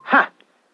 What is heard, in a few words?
ha